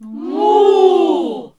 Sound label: NOISE, CLASSIC VOX; Best Service; Hallelujah